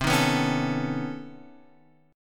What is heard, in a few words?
B 9th C down Guitar Standard; B9/C strummed; JGuitar